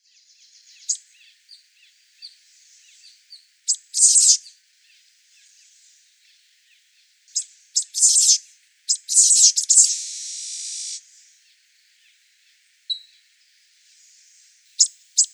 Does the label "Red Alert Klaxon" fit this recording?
No